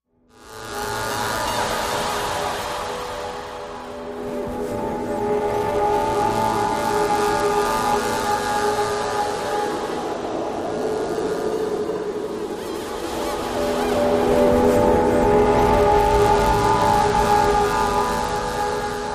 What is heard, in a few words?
Laser Wounds Machine, sci-fi ambience